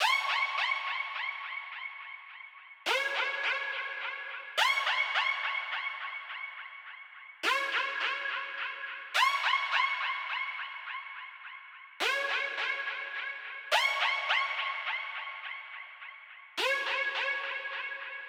105 BPM C# Min FX; Loop Stems; Cymatics; Go To War

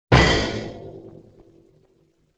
Bass Drum Cymbal Hit; Vintage Cartoon; Drums & Percussion

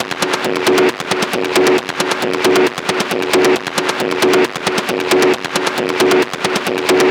Perc Loop 135, Techno; Loops; Drums; Ghosthack; AC2024 - Day 1 - Techno Sounds 2025; Percussion

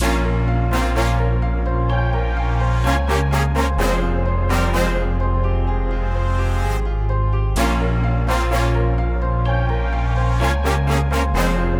127 BPM F Min, Trap Melody Loops; Major; Cymatics; Nightmares Trap Melody Loops

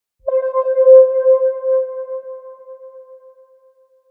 Tone

